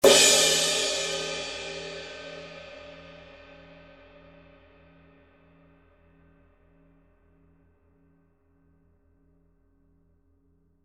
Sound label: crash